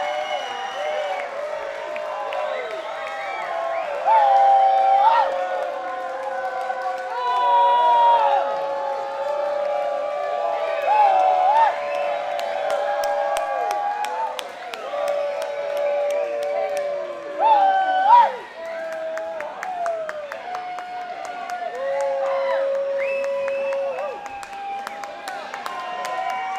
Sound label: Crowd Noise